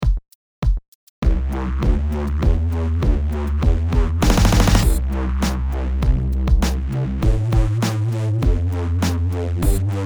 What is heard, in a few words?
Silent Drums